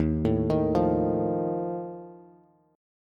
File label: Eb Suspended 2nd Flat 5th Eb Bass; Ebsus2b5 arpeggiated; JGuitar